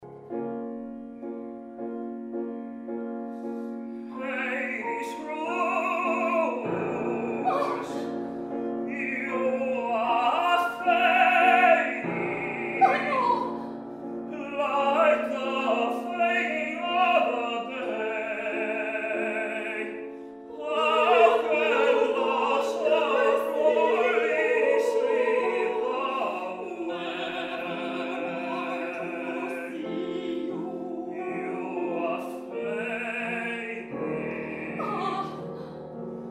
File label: duet; orpheus